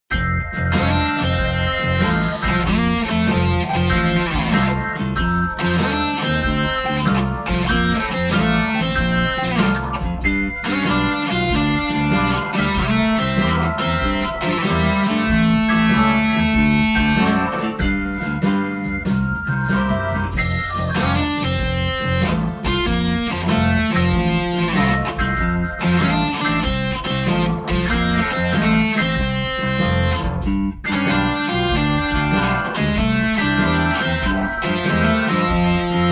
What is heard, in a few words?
Blues